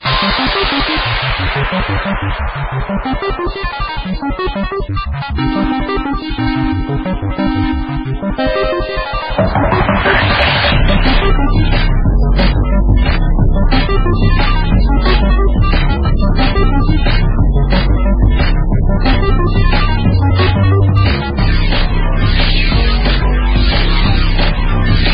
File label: music